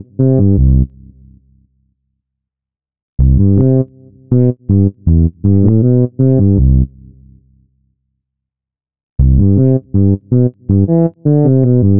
Fretless synth, ambient

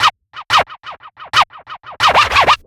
Scratch

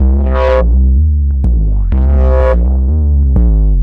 Crass Bass, минимал

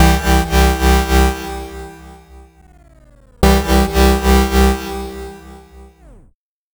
Dirty Chord, dubstep

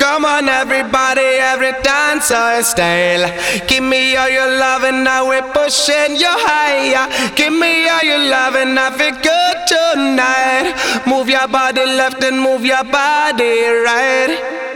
Dancehall, electric jazz

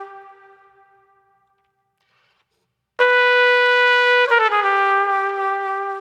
Trump Smooth, brass

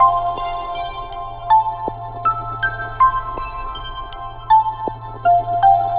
Dreamy; Downbeat

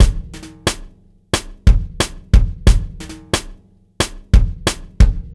On Beat

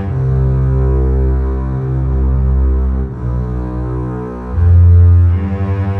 Bass Strings, Downbeat

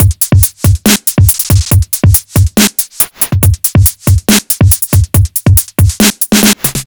Straight, 140 bpm

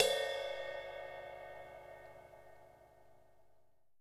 Cymbal

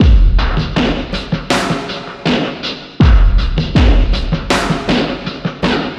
Pillowrock, ambient; ambient vol 2

